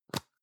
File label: plastic can lid screw open hard; objekte; dose